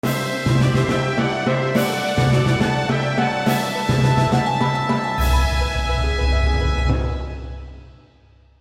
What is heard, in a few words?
fanfare